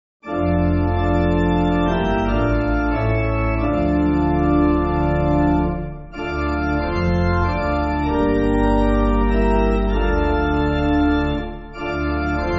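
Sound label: Pipe Organ, Backing Files for Churches; St John; Dykes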